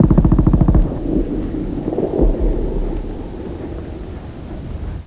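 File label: machine gun burst; ambience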